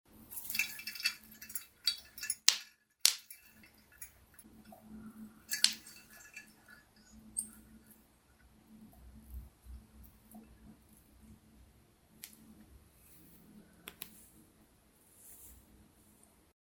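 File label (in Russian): glass balls collide with each other; Звук раскачивающейся люстры для монтажа, для создания атмосферы ужаса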